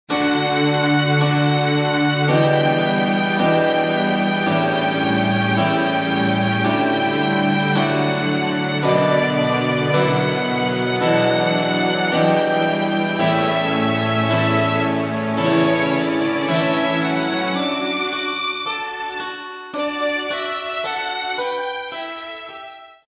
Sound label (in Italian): CONCERTO IN SOL MAGGIORE